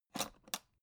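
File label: aluminium case small open short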